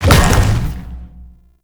bowl ball impact; Warframe; Grendel

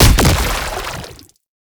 Grenade Explosion; grenade launcher; weapons; Scaldra

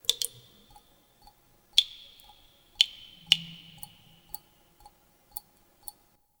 Terrarium Drips; Ambience; Warframe; Entrati

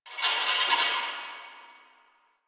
Fence Rattle One Off; Warframe; Ambience; NineteenNinetyNine

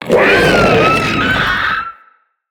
Spider Vocal Death; Vocals; Root Spider Mother

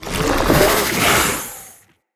Attack Swing, Vocals; Enemy; Characters; Corrupted Sproutfolk

